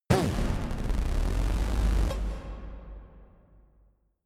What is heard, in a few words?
synth bass pluck